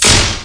EMU SP 12, drum machines; Kult

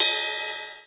classic hh Ride; Hip Hop; STYLE KITS; Classic Hip Hop Mega Kit

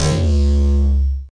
desecrated bass hit, evil bass sounds

